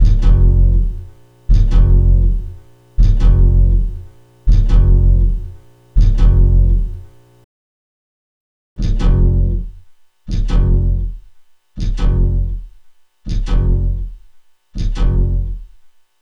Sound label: Sampled Guitar NR Before After